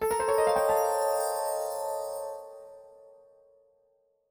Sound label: Suspence Sting